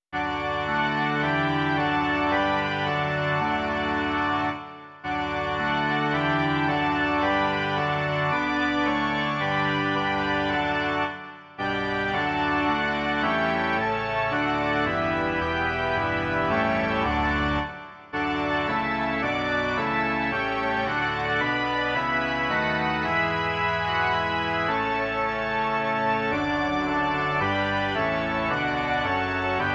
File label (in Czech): varhany